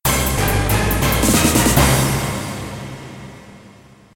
victory; sky knight

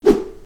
punch

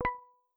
plink

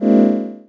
heal

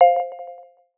roulette land

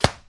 arrow impact

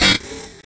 shield hit

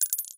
cartridge

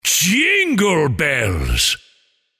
Jingle Bells

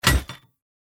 bear trap deploy

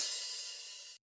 hihat open, jazz

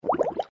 explosion; apples

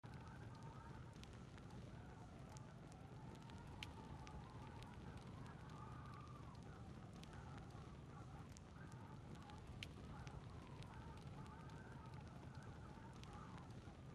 amb home loop; ambient; everdawn

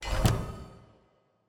dispel; spellbound

